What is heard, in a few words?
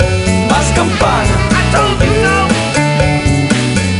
Cowbell; Happy Feet Two; NDS